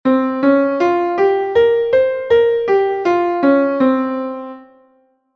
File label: insen, japanese scales